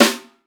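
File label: brush hard; Northstar - New Gold VOL-2; 50S BRUSH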